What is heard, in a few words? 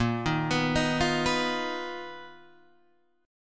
B Major Sharp 11th Bb